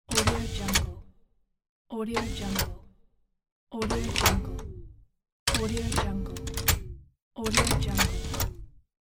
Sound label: metal movable parts